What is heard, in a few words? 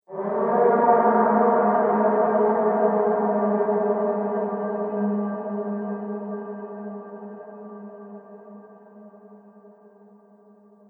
Evil Spirit Graveyard, ghost; horror; Ghost Sound Effects